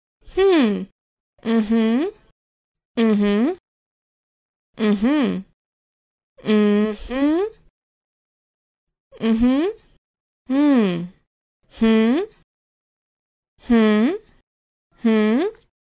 waiting hmms various

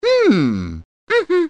hmm mhmm; mario

